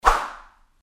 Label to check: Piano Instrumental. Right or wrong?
Wrong